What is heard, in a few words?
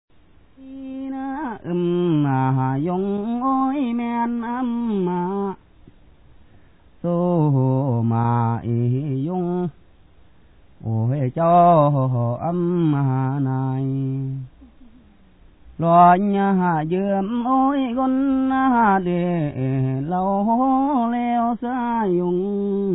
Khamu songs